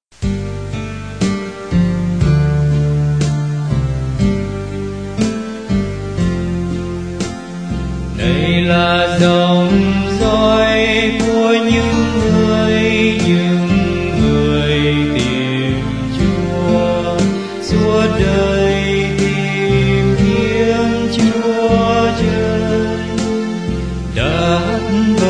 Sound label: BASS